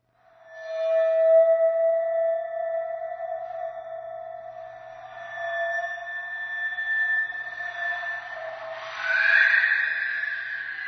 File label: moon, ambience